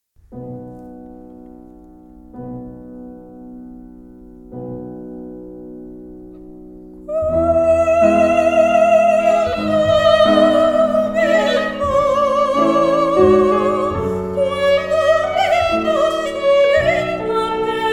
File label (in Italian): soprano